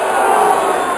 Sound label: boo